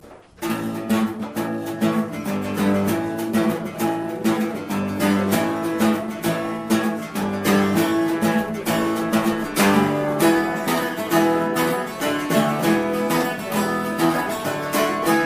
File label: sporting paddy rythmique